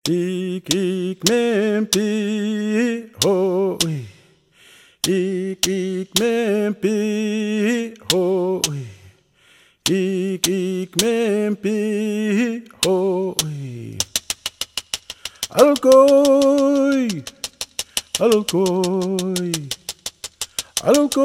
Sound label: dolphin calling song